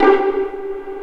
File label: Vio Pizz; Digital Sound Factory Virtuoso